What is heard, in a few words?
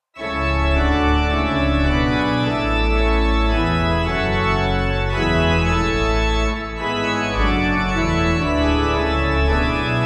organ 3vs; wareham; Hymnal 1982